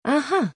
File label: "aah"; interiezione